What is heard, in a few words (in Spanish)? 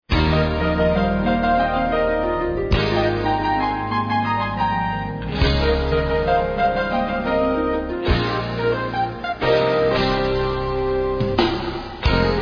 amor serrano